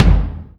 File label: Drum Rev Bass Impact